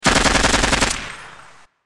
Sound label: machinegun